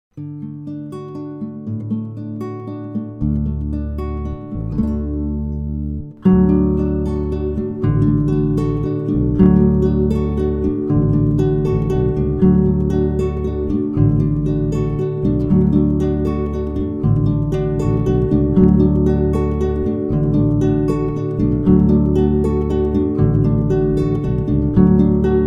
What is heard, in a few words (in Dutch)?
row your boat zonder zang majeur